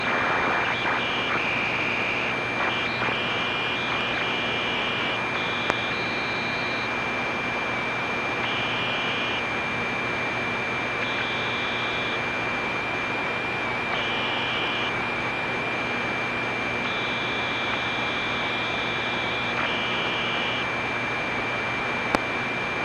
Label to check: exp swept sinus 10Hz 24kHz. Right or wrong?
Wrong